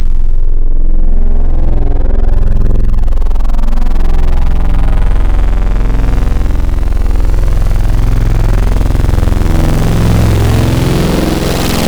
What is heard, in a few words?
Static Fields, Wavetables; Cymatics; Secrets Wavetables; Serum